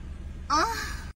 anime ahhh

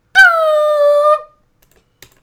lil air horn

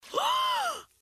gasp

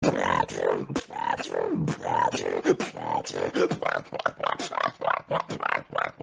beatbox

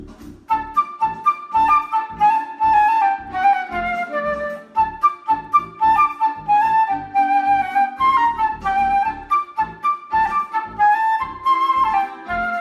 flute cover

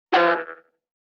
konk and rapid vibrating boing

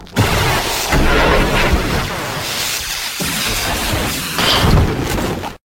lightning sound long

